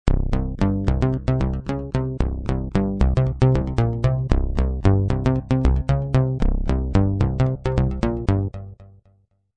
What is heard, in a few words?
BASS